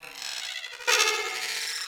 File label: Beep Stutter; Warframe; Corpus